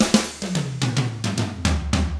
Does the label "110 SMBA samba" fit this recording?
Yes